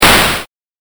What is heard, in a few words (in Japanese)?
8bit 消滅